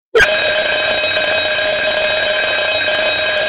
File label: Vintage alarm